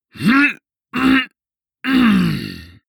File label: male harrumph angry